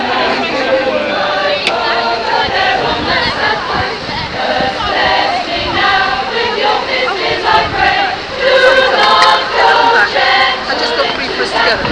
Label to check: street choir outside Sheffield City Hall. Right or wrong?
Right